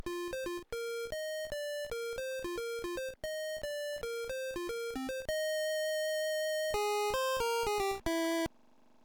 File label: harmony